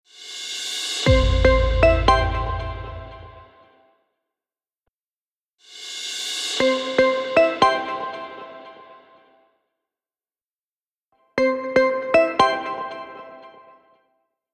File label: pluck tech logo